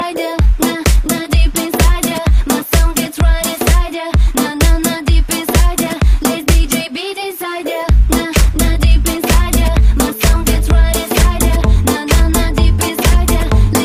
Beat

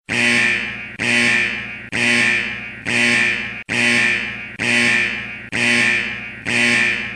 Alert

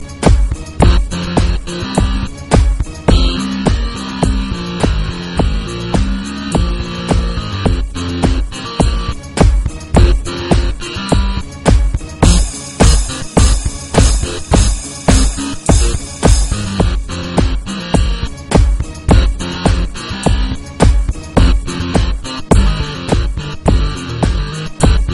Airtell Remix Tone

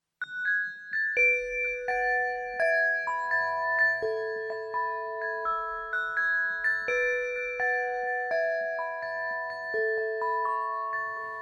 Cute bells

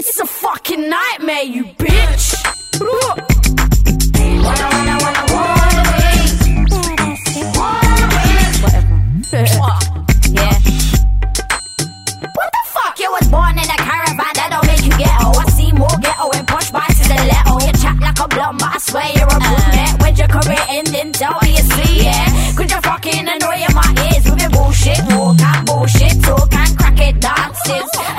Good Beat

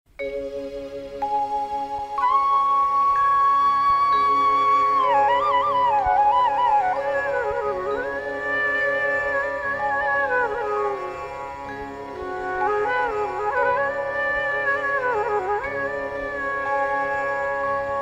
Morning Flute Cool

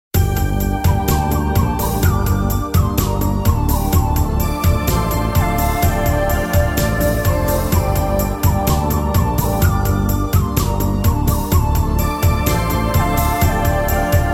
Indian Meditation

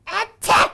Four Sneeze

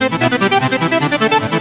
Techno Message

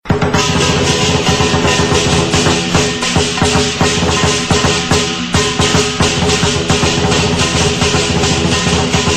Drumming; Lion

